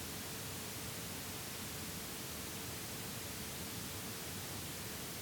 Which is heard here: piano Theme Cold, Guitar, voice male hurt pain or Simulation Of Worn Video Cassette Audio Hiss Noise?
Simulation Of Worn Video Cassette Audio Hiss Noise